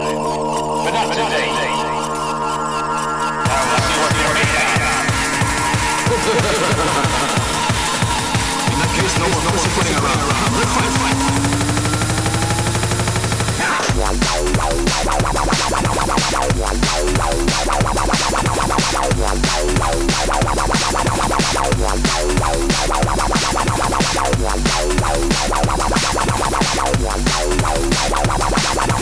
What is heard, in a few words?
Mega Bassline Brap